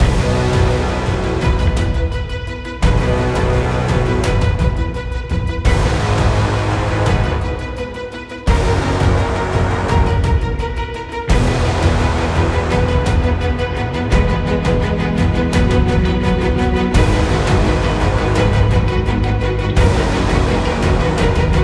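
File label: Music Ambient, Ambient Pre Race 85bpm; Music Amb 3#9 (Pm Amb Pre Race 3 85bpm Game Mix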